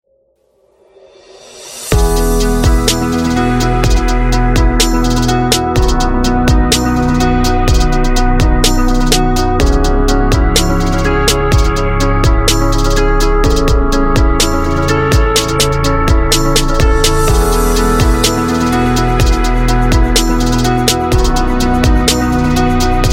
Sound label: Hip Hop Lounge